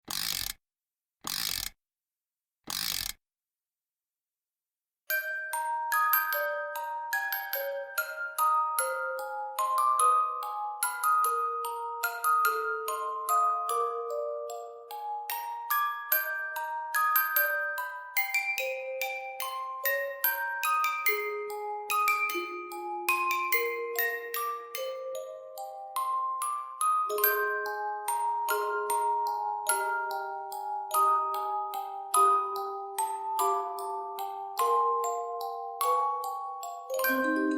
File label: Lullaby